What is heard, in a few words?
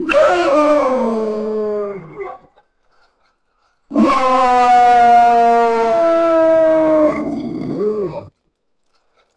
snarls and vicious wolf g; brutal